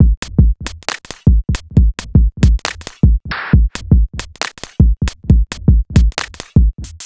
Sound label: Bpm136 Break, dubstep drum loops; Darth